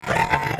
ALPHA ORC DOG Die

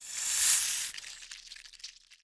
ambient

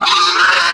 HURT

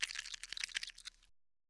RAT EAT

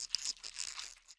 WALK

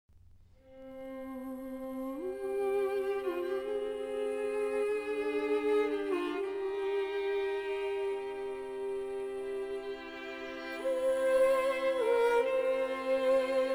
Sad Strings

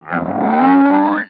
DIE

